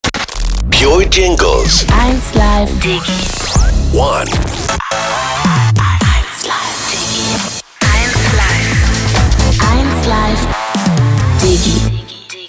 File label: jingles; pure; 1live diggi